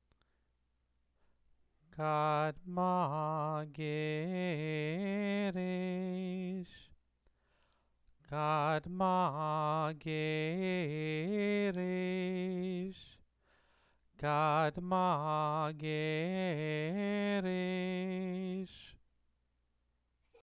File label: Megilat Esther exercise; prayer; megilat esther blessings and trop